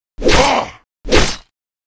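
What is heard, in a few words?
Mob attack; Monster; 묘족; 양왕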